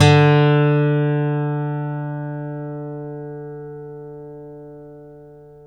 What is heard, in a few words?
STEEL F D2, Synclavier, GUITARS; Synclavier Sampler Library